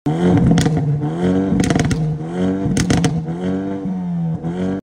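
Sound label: Hyundai Elantra, exhaust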